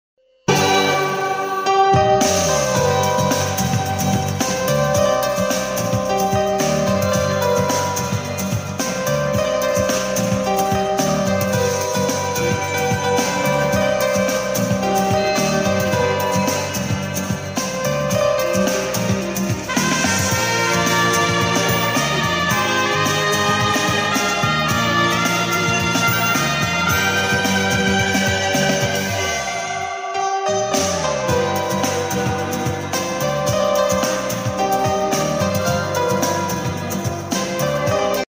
piano music piano music

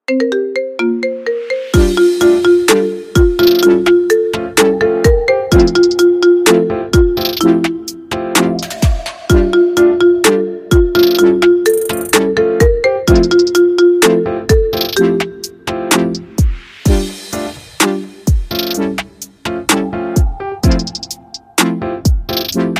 marimba ringtone

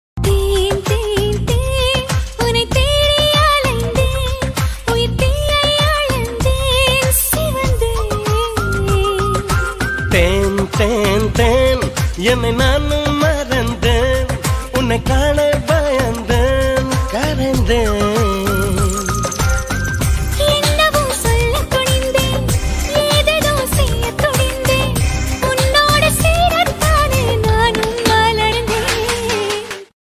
bgm ringtone tamil love ringtone; kuruvi

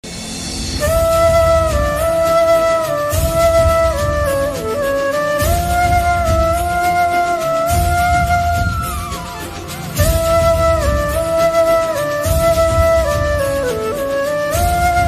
Radha Krishna flute music

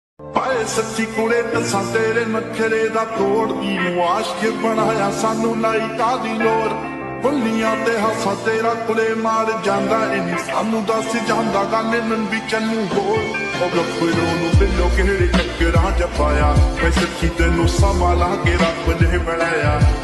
new punjabi song

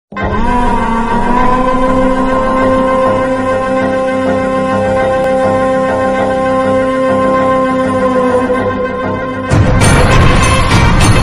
villi bgm ringtone; sandakozhi 2; pechi

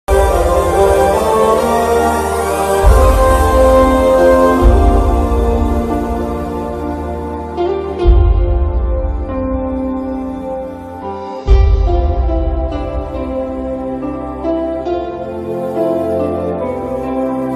emotional bgm; Oopiri; dolby digital 5 1

